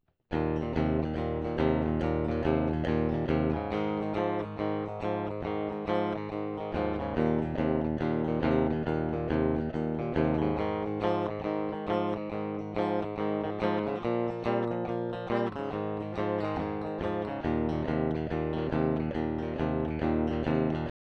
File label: Clean Combo